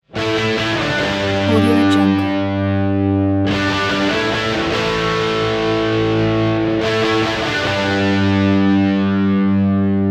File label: wrestling rock